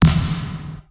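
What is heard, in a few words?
DRUM HIT; Best Service Advanced Media Trax Building Blocks; FAT ROCKA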